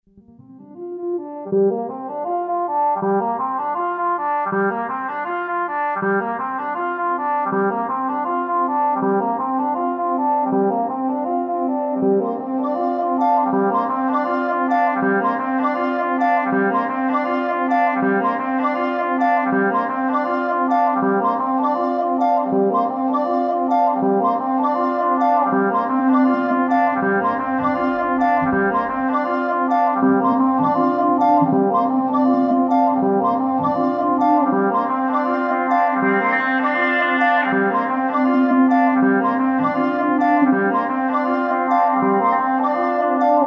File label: Brain Scanner; Noisebiscuits